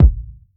low tone kick one shot a sharp key

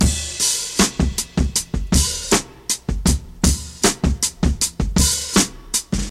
79 bpm 2000s drum loop d key

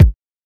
verby steel kick drum sound a sharp key

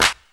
clap sample d sharp key; snare drum alternatives

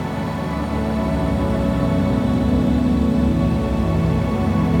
light wonderful texture 100 bpm

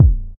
nice bass drum single shot C key

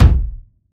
round bass drum sound c sharp key; kick drum samples

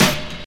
acoustic snare sound f sharp key

